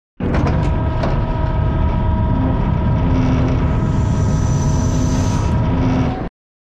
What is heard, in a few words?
Giant Floor Panel Assemble Start servo; Boomtown; Sideways Town; Titanfall 2